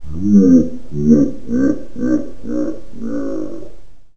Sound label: death